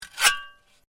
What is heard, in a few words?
Shovel Scrape; Working with a shovel or spade; Scrapes; Objects